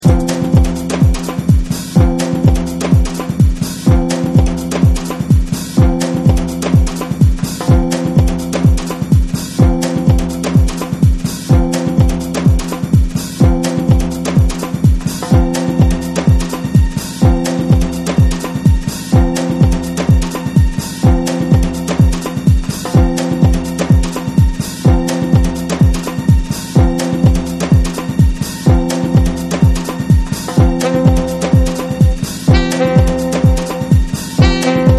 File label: electronic